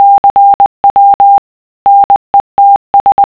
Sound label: cw nets